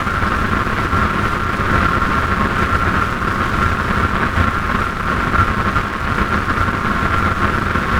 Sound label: Drone Ambient; Drums & Drones